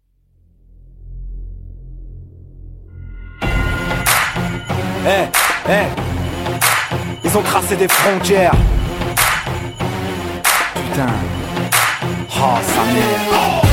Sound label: French Ringtone; meloboom